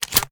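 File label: shotgun reload loop fast shell in; reloads; iw8; romeo870; gmod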